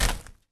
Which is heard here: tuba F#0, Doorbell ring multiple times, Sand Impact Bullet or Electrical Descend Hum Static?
Sand Impact Bullet